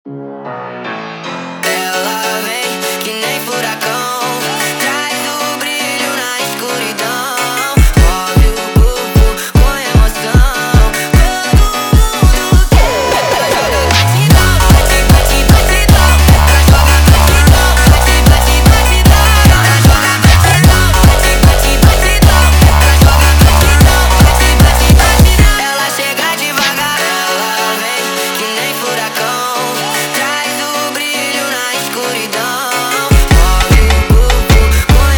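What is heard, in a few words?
sped Up, phonk music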